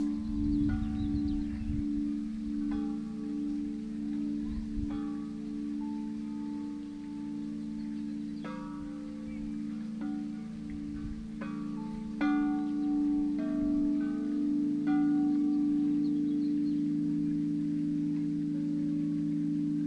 chimes; krause

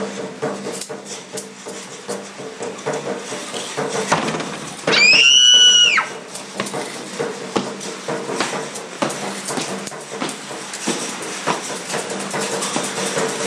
haunted house

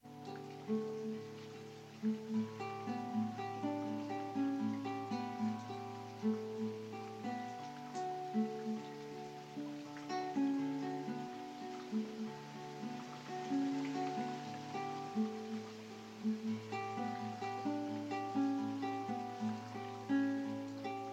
Guitar playing; This is a recording of my brother Jeff practicing the classical guitar

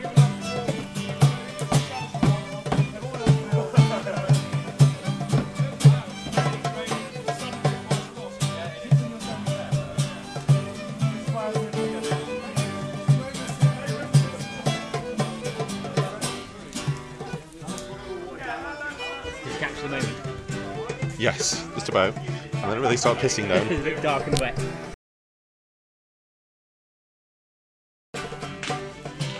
folk music jam; yule night party

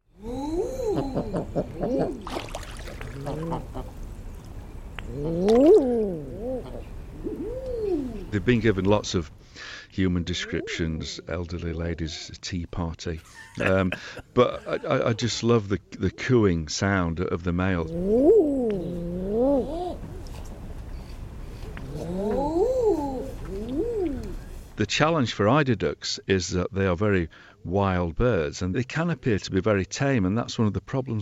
amazing British animal sounds; the frankie howard duck

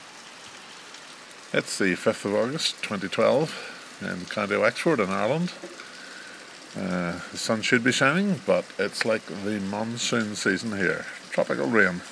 rain in ireland

